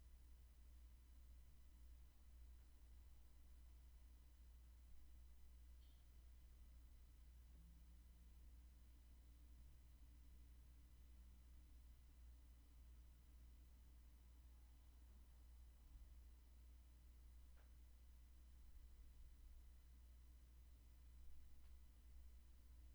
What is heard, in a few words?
roomtone, Degassing